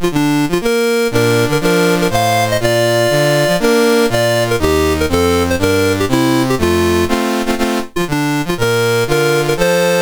Cameroon, National Anthems, MOS6581R4

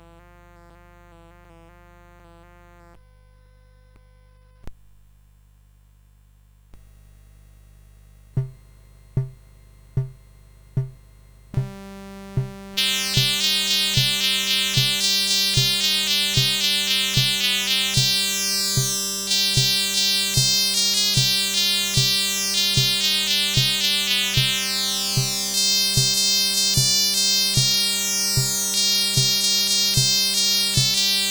CSG8580; CSG8580R5

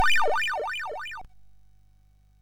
sid MOS6581R2

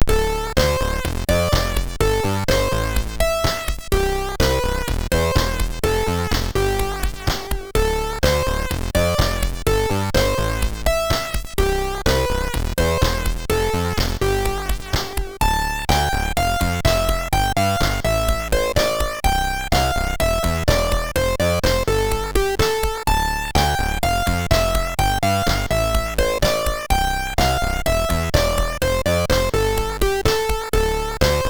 Lights On, MOS6581R3

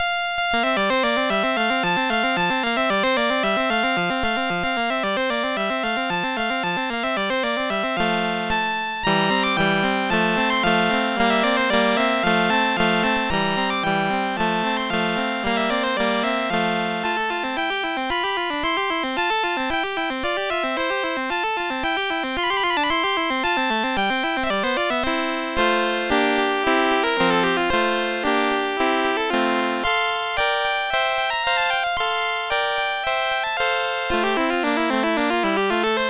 Gavotte, MOS6581; Les Petits Riens

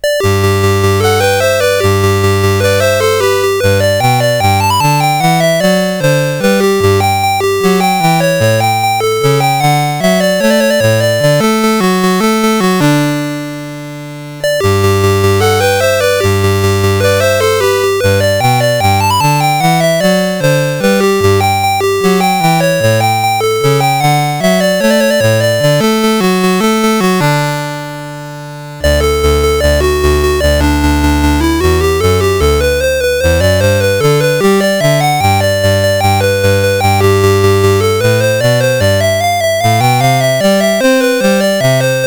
March in G, CSG8580R5

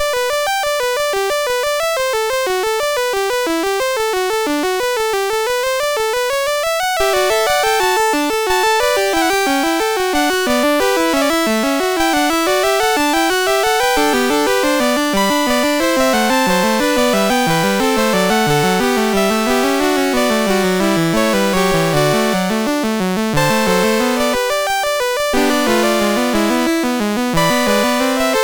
Fugue in G Major, MOS6581R3

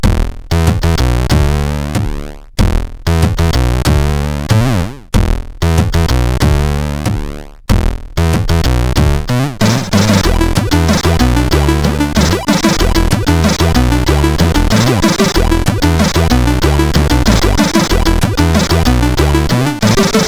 sid CSG8580R5

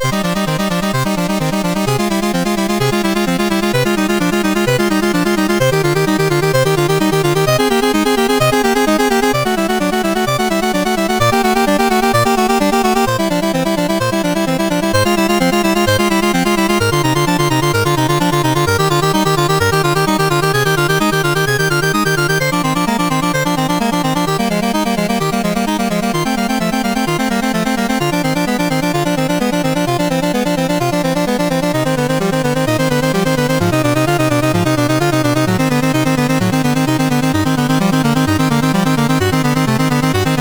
Prelude No2 in C Minor, MOS6581R4; Lord Myrkul

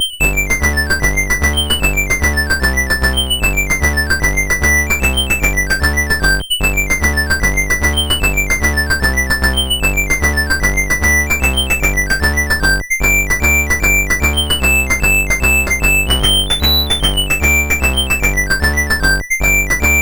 Jig, MOS6581R3; Gary Owen; Digger

